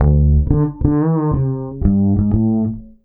bass, Drum & Bass, 180 BPM; Ueberschall; Drum & Bass Resonance

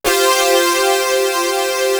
PWER 4THS; East West; Global Sounds; ORGANS

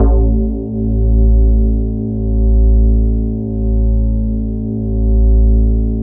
E HARP L, DX HARP

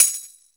TAMBOURIN

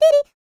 YODEL; ILIO; Vocal Planet

